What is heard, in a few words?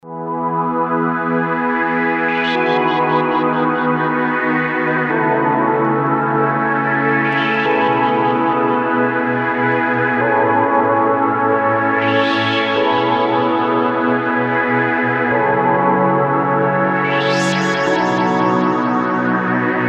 Korg DSS-1; resonant pad; main core based on 8 bits CPU Intel 8085 manages 8 voices polyphony